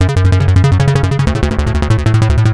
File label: Fast Chase Loopable